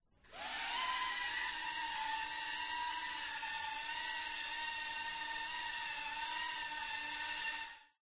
Drone; fox audio

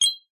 multimedia game tone metal chink collect action

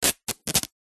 office parcel tape peel from roll

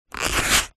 household match box open; zapsplat

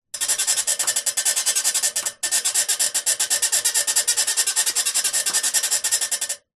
foley metal creak wobbly frame; zapsplat

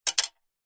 single small stone drop on metal; foley

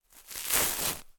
horror cobwebs sweep away by hand

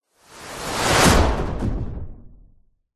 sound design cinematic whoosh into punchy thuds; zapsplat

